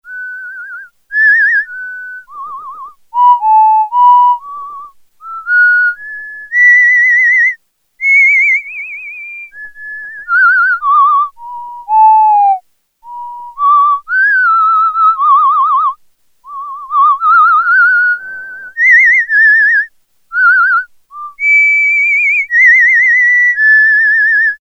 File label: Whistle, Prophet-5; Sequential Circuits Prophet-5 (Ver.3); Factory Patch; File 2 Programs